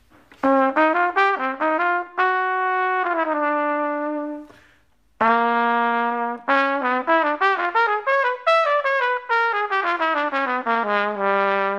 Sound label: Cadenza, Trumpet